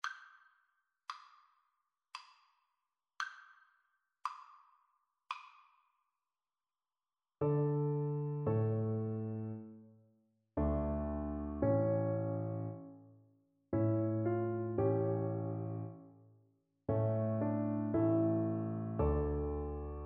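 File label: vibraphone, percussion; 1stmvt; fourseasons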